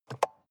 sports car int compartment open soft; verkehr-transport

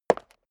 step leather shoes concrete single right

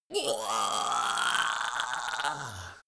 imp die; schreie

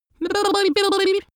lip smacking child; vocals; comedy-cartoon; soundpool

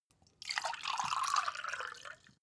glass pour; soundpool

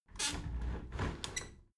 window handle squeak turn open halfway soft; objekte; fenster

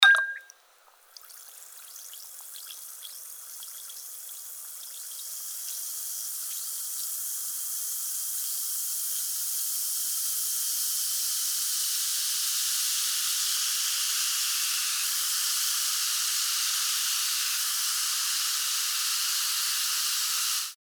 drinks fizzy tablet in water; haus garten; kueche

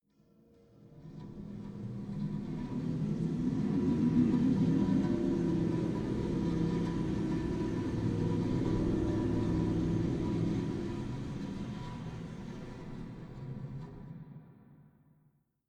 energized ringing humming; maschinen; atmosphaeren

